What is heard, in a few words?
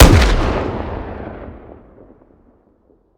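weapon pistol mag fire player atmo ext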